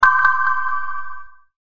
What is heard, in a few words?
Crystal Bell